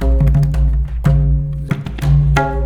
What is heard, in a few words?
MRIDA, Indian Traditions; USB Soundscan vol.45; AKAI